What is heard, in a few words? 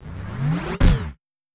zip sound